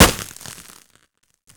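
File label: ricochet; weapons fx rics; Counter Strike Model Pack; CS:GO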